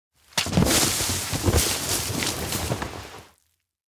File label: muddy slope slide roll; anemoi パッケージ版